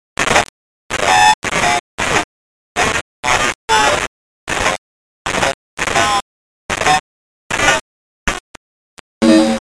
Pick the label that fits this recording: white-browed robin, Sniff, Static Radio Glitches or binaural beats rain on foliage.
Static Radio Glitches